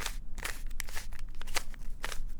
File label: Big Cube - Twist, Rubik's Cube